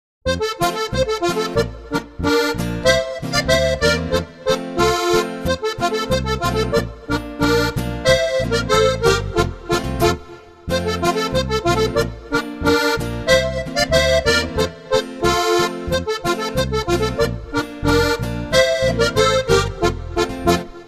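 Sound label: sunnleitn boarischer, Steirische Harmonika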